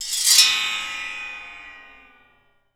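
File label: METALLIC SCRAPE, WATER FX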